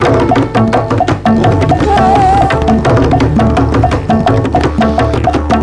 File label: RHYTH, LOOPS